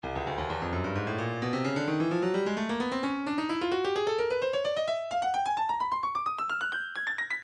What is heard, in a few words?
breakbeat midi, 130 bpm; Stylus